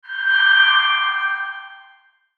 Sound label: Logo Ring Reverb; Sonic Logo Sound Effects; Production Element Imaging Element Accent Transition